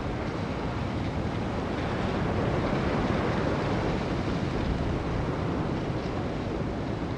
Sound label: wind trees